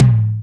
mid tom drum one shot a key, tuned to the A note. Loudest frequency: 359Hz; 25000 Hz